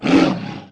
skel prisoner attack

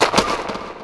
bloody knight move

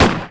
armed villager attack

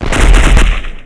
hunter claymore trap

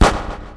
explosion attack

